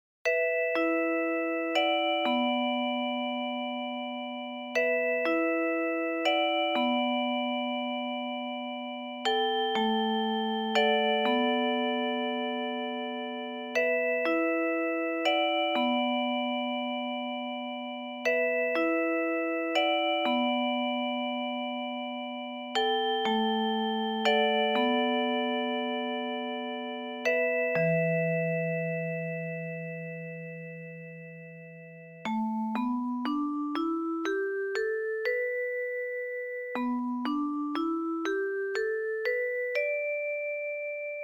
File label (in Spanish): estudios basicos armonicos natural